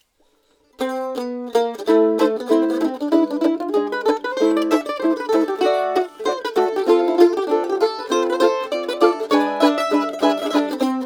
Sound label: Mandolin